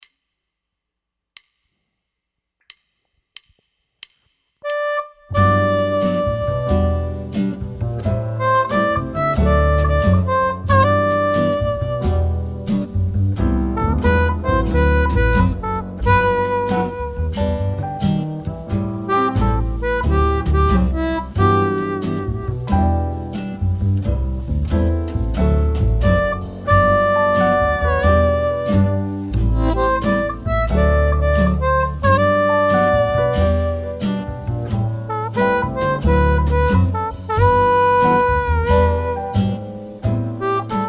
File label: G 90 harp